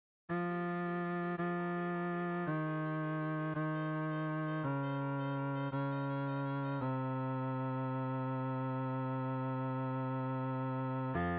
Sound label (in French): basse; Si ca vous chante; lettre Q; tempo noire= 166